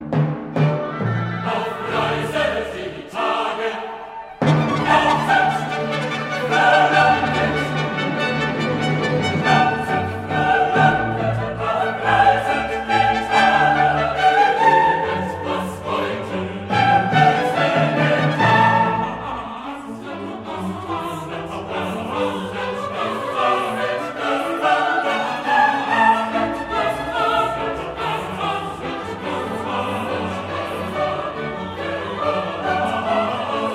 Coro

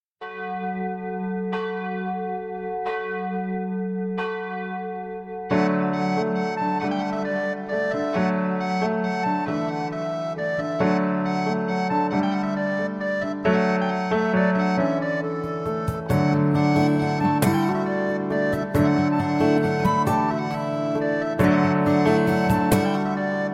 StudioChor

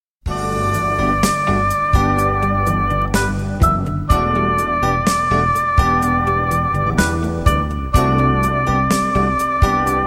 Studiochor, Vocals

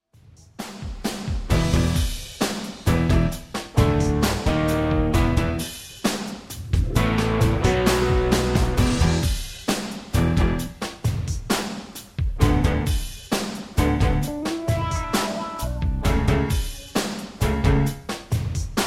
Rap und Finale Playback

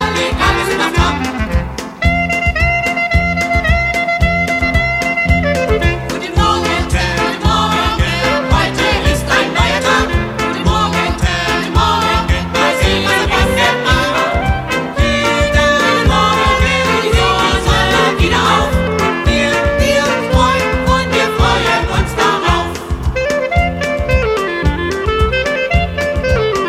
Lied Guten-Morgen-Country-Song

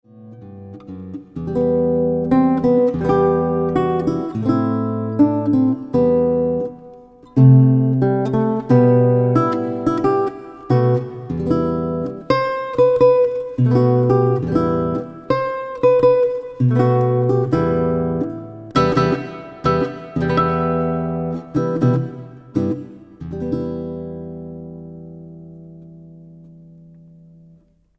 Adagio e-Moll

